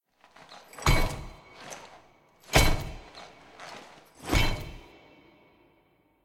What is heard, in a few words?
walk creep